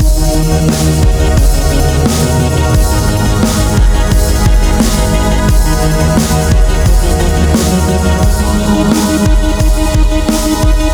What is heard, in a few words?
boss battle loop; nh demon